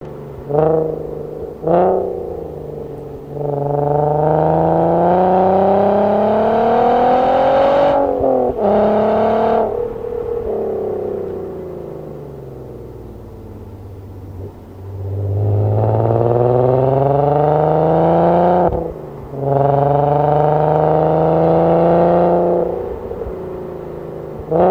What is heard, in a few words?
r32 twisty road; More cruising